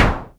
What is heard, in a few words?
SYNCUSSION